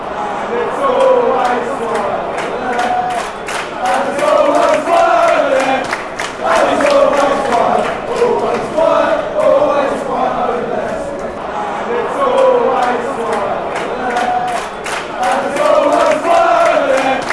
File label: always quiet watford, chants